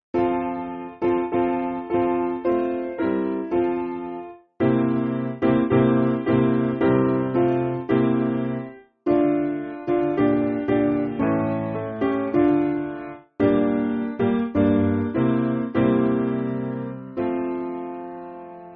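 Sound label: Piano, Music Backing Files for Churches; Evelyn; Sullivan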